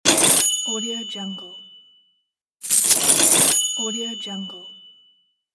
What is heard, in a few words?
money